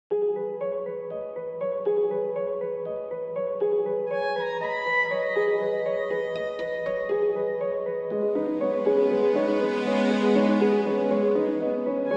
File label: shimmer